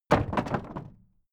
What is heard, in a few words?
old wood case wobble